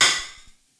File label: OH China, Open Hat; Lost Tapes From Mars